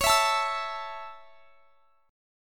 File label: Db Minor Sharp 5th Db; strummed